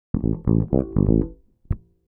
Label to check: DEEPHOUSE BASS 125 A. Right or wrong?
Right